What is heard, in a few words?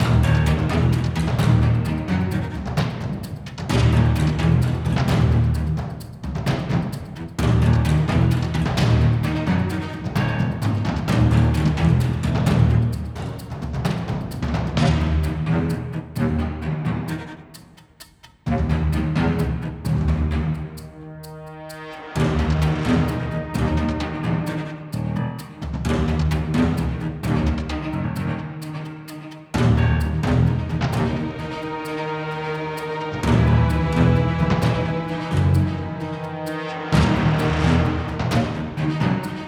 Tense Combat loop; Grand Battle